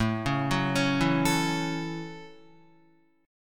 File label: A 6th, Guitar; arpeggiated